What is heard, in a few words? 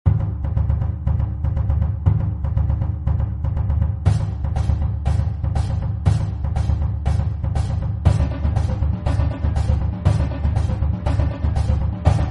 Rumba